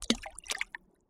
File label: water splash